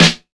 raw snare sample e key

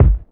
studio kick g key

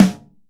snare one shot f sharp key; Bitdepth 24

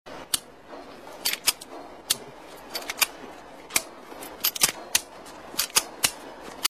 gun cocking repetitive

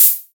urban open high hat sound d key

